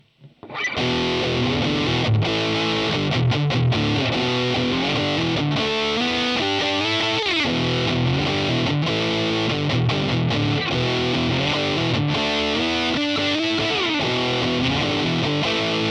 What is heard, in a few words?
bulldog drive; Hi gain